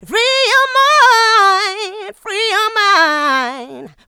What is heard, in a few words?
Female Vocals; Houseworx